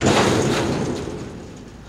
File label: Jeep Crash Metal, car crashes